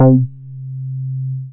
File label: BAS MINI, MOOG; Sound & Vision - Gigapack II CD 1